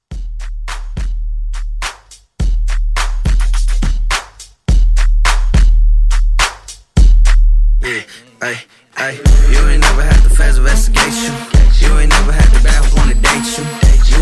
Mixshow Re Drum 105; GeekALeek

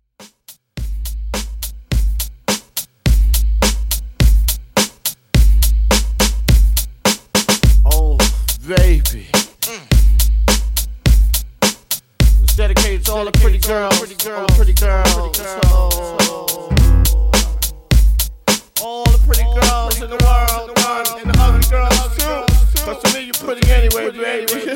105 Bpm; Clean Intro Edit

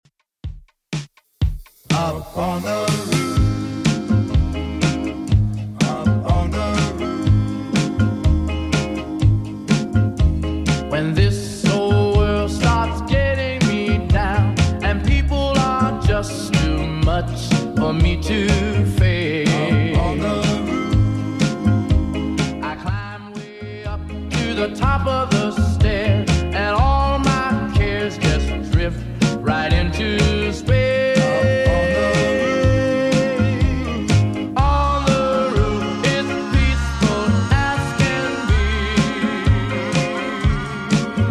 60s Redrum 123bpm

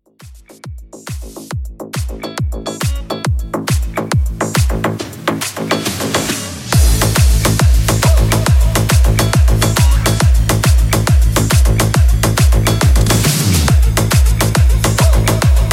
EDM Intro

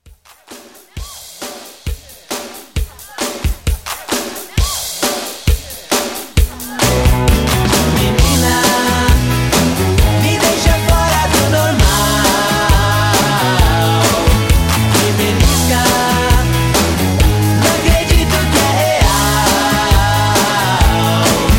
Mixshow Re Drum Banda 133 CLEAN

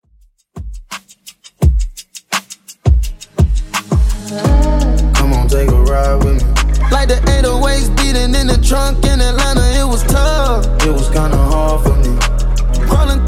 Top40 85bpm; Xtend Edit

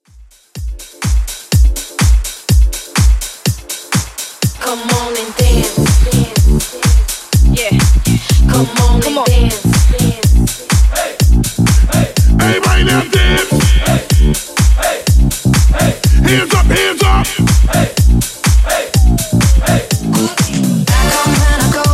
Disco Hype, 124bpm

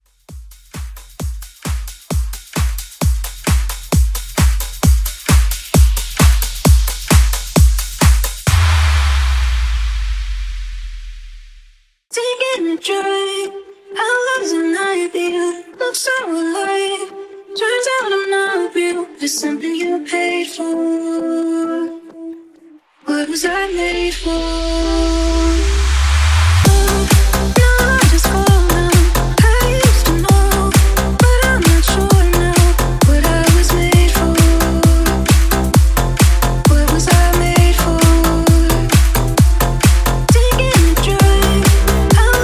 Top40 Dance

